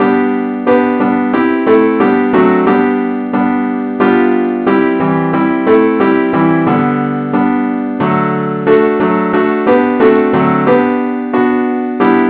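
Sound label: advance accompaniment key g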